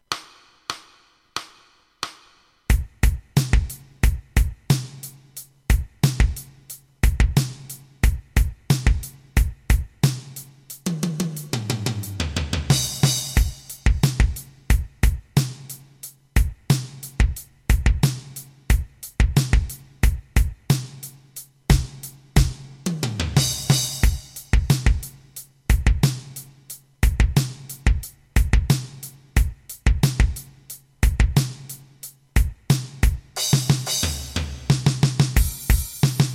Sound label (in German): rock etuede, 90